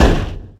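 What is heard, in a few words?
impact metal hollow; Cars; XBOX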